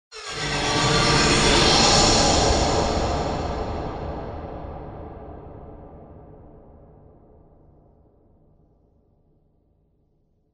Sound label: Horror